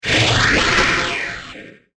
Rampant Laser Forcefield; Sci Fi Music; science fiction sound effect; star wars / trek style games

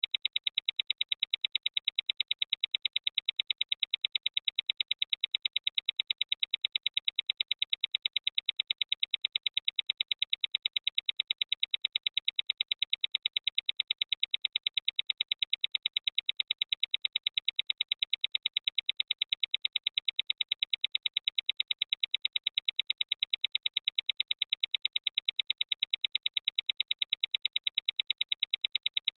Science Fiction Beeps Timer Counter Device; Tech (Sound Effects:Science Fiction)

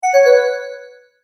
Multimedia Tone-Return/Back; Tones; Sound Design; McKinney Sound

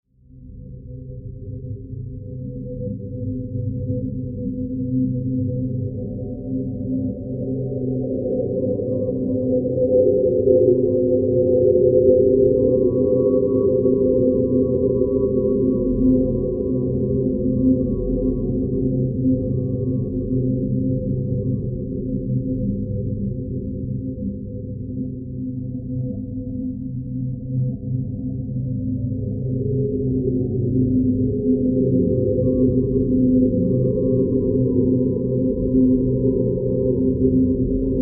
Drone, Alien, Whale, Song, Ethereal, Scifi; 5.1

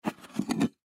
Dinner Plate Up, Dinner Plate Pick Up Table; Dishware; Blastwave

